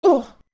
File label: Ledge Fall; Zora Link; Link falling off a ledge